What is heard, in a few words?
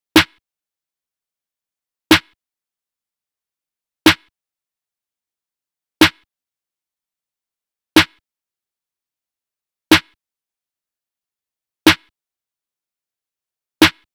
Drum Loop 123 BPM Snare; Drum Loops; Loop Stems; Cymatics; Guard Drum Loop